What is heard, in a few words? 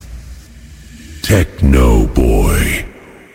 Sms techno